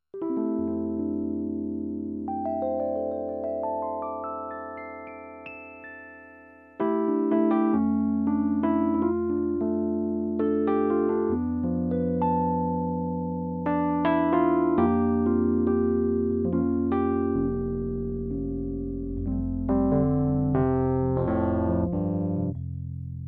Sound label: rhodes